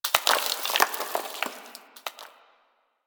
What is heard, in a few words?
Falling Sand Rock; Warframe; Man In The Wall